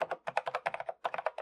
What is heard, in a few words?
Friend-Typing